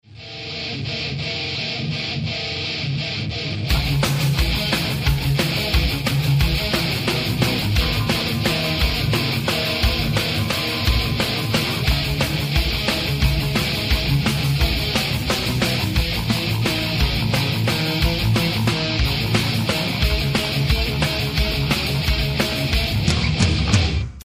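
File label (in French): guitare électrique riff; deux riffs de ma composition entremêlés de façon progressive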